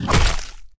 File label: attack red; steamcommunity; saliengame